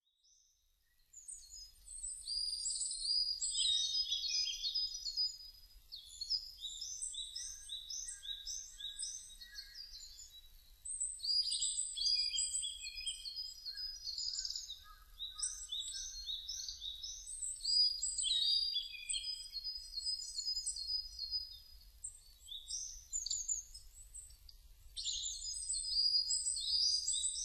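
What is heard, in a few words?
birdsong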